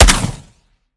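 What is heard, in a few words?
weap suppressed; weapons; gmod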